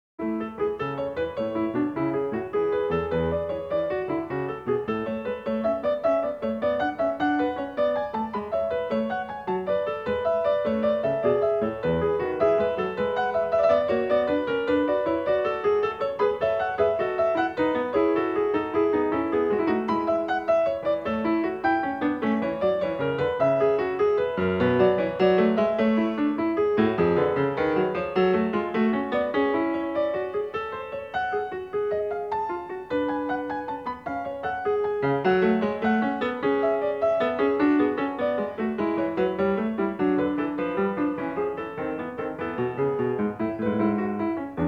Prelude No. 19 in A major, Bach, Well-Tempered Clavier; BWV888